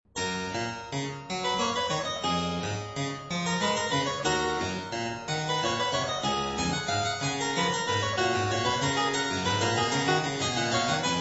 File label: Variatio 26 a 2 Clav.